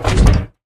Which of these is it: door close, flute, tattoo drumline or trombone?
door close